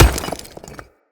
Great Sword Melee Impact Stone; Weapon Impacts; Player; Swords; Environment; Founders Great Sword

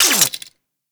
Quill Dart Gun Electric Mech

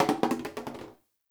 Janitor Cart Plastic Bottle Drop; Warframe; Ambience; NineteenNinetyNine

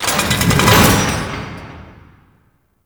Portal Stairs Reveal; Tau; Proto Frame

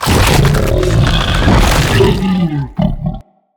Struggle Left Head Success; Corrupted Bromius

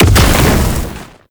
Bow Projectile Fire Explosion; Madurai; Focus; Ultimate Abilities

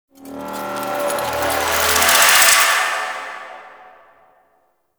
Hand Open; Deadlock Protocol; Cinematics

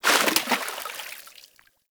Forest Fish Jump Water; Props; Warframe; Grineer Forest

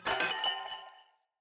Corrupted Battle Dog Bell Tail Wag Foley Fill

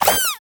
Shrink Baby Jump; Warframe; Nokko

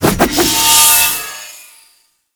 Staff Heavy Charge; Warframe; Duviri; Dax; Weapons

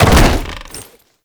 Land Heavy Wood, movement; Warframe; Uriel

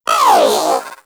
Zanuka Companion Vocal Pain; Pets; Warframe

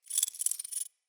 companion collar jingle; Pets; Zanuka

